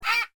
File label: buzzard hit